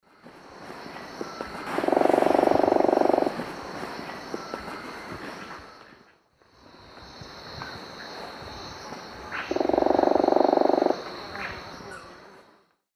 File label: Celeus grammicus, drum; S. America; Eastern Lowlands